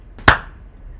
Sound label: clap